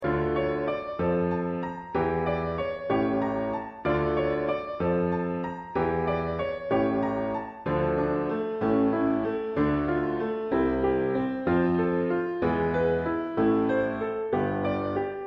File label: Piano